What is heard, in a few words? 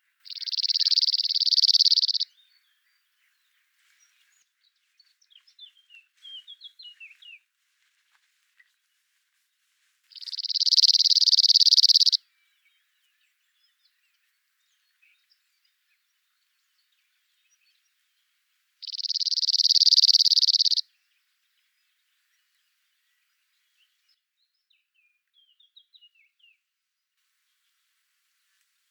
call, cirl bunting, Emberiza cirlus, Note the fast rhythm of these song phrases. Background Blackcap; The bird is on its habitual perch, probably not far from its nest site